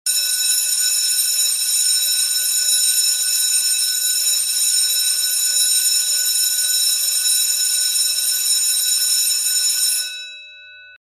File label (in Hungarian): iskolacsengo